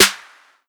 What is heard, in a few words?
snare wasteland, Hip-Hop Sample Pack, Drum One Shots; Drums; Slate; CAICOS Hip-Hop Sample Pack